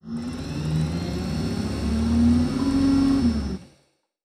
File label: servo big, sci-fi sounds, mechanical